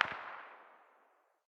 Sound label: pistol very far distance; 45acp; tacz-tweak-pack; taczmechanics